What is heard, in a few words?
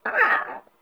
Sprout Vocal Grumpy; Friendly; Sproutfolk; Fey Hub Sprout